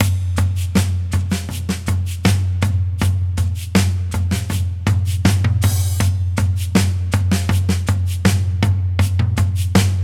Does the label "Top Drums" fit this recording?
Yes